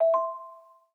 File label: system notifications; Amazon Fire Phone